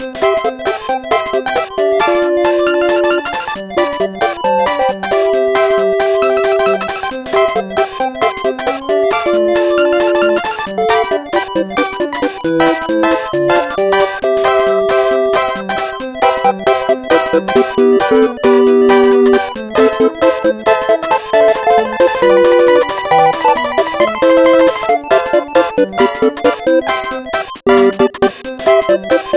String Skip Saloon

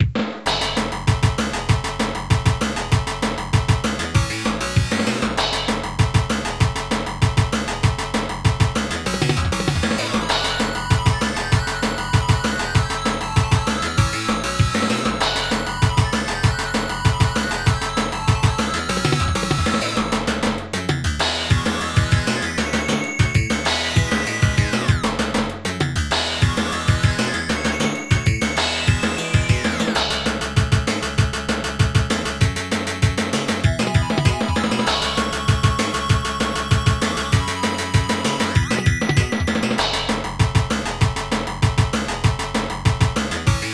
Stage BGM; nouten densetsu; x68000; Beatin' Beat

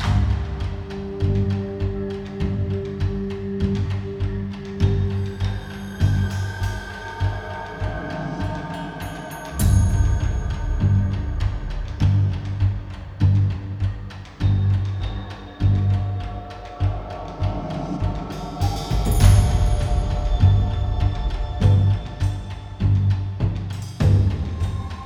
music caution; Aragami

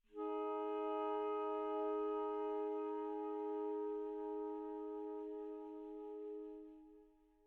Sky Low Altitude Melody Day A2

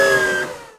Servo High Stop

